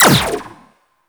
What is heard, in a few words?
Energy Rifle Mech; Weapons